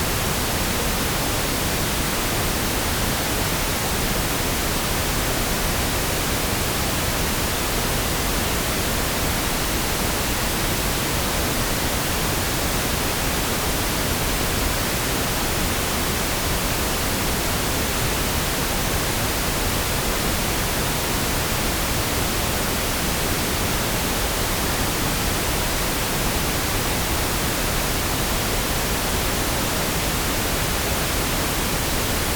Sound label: Pink filtered; Pink 0vu 20-20k filtered 48k 24bit DScope